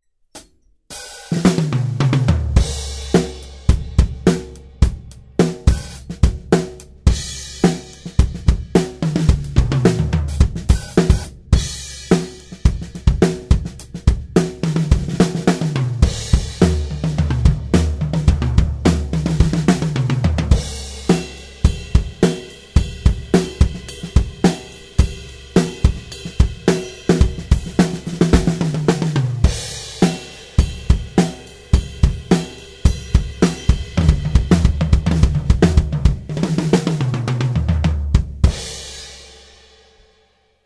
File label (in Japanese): 生音サンプル SSL; ssl pure drive octo